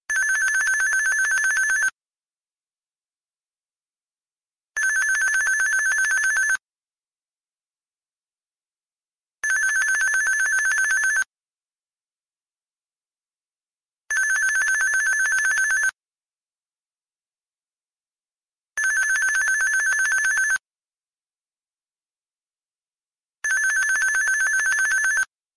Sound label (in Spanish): Nextel Real, tono de llamada